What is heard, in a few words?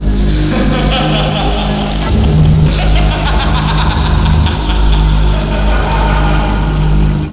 laugh